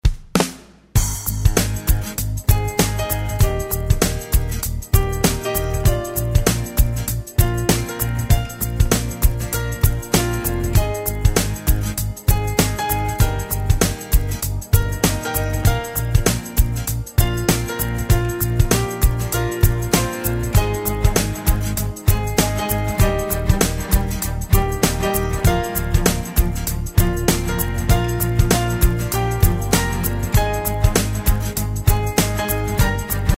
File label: Music Sample; On Hold